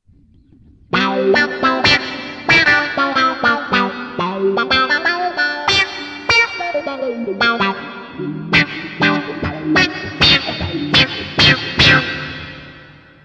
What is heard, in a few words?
T Wah, Boss GT-8